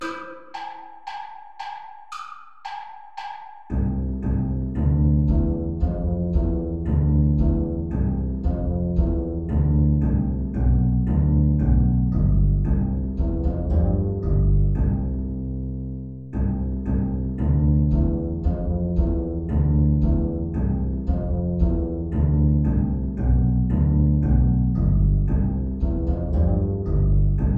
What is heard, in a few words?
air contrabass play along; bach johann sebastian